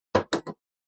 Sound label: sound of items being moved; winchester; century ghost